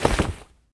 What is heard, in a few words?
cloth; Rust